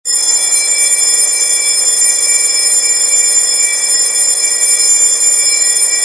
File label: Alarm Super Bel